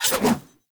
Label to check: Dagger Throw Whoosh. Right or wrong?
Right